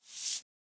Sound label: sliding paper